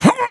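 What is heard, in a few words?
voice leap up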